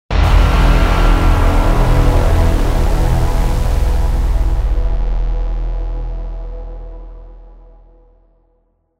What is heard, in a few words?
Trailer Braam; Production Music; CATastrophic Trailer Tools; Braam 2